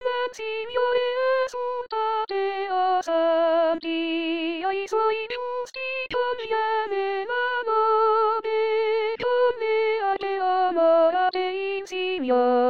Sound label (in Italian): Nel Signore Esultate Soprani